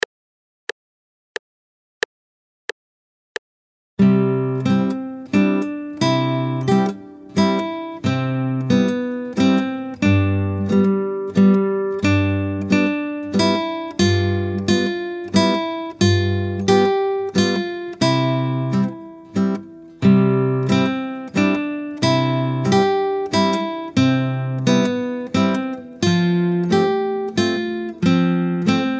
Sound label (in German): Landler Walzer